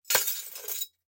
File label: Glass Break; SoundsCrate